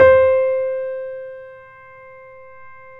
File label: BOSENDORF